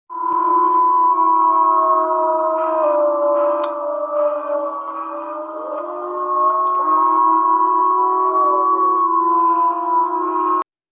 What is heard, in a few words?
wolf